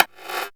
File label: percussion, Severe Gate Kit; Dance Synths